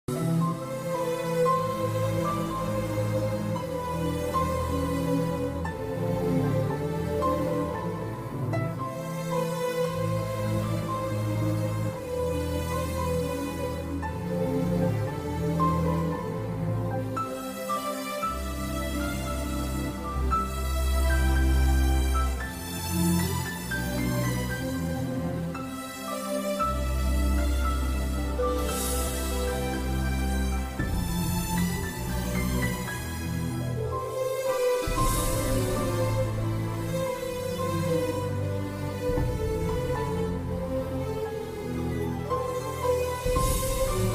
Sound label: love feel good bgm; Parugu